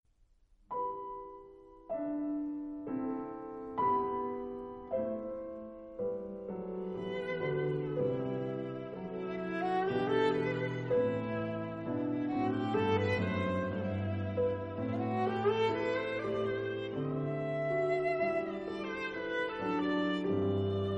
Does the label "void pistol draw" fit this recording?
No